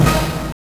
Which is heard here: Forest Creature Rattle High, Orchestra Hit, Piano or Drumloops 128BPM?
Orchestra Hit